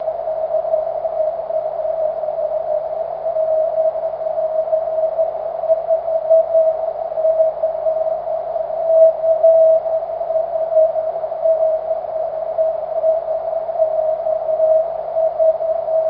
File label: cw